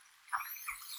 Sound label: Chirp